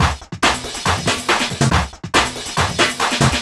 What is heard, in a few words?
LoFi Beats, 140 BPM